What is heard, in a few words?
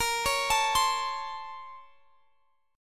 A sharp Minor Major 9th, down